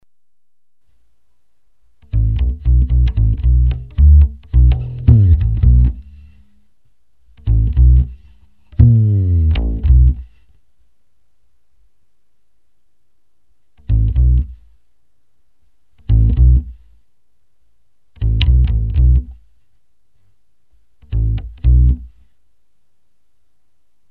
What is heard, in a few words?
Bass